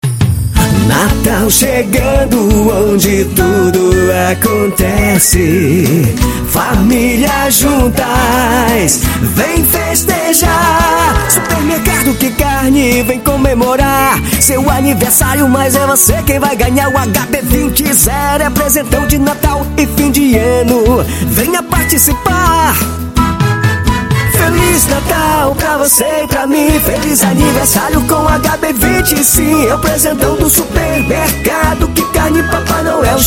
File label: Jingle; jingles natalinos